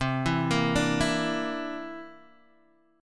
C 9th, Guitar, Drop D

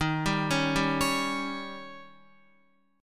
Eb 7th, Guitar; Eb7 arpeggiated; JGuitar

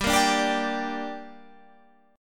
G Minor G, Guitar Standard; strummed; JGuitar

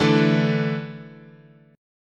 D Suspended 2nd, chord

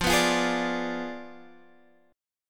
F Diminished 7th F down Guitar Open G

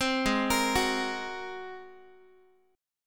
Ab 9th Ab down Guitar; Ab9 arpeggiated; JGuitar

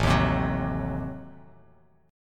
A Diminished 7th A down Bass Standard; Adim7 strummed; JGuitar

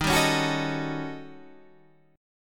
D sharp 7th Sharp 9th Flat 5th

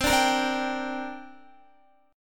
C 7th Suspended 2nd, Guitar; C7sus2 strummed; x,15,12,15,14,14

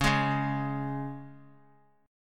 Db 5th Db down; Db5 strummed; JGuitar

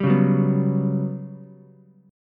G Major 7th Flat 5th, Ukulele; GM7b5 strummed; 0,1,2,2

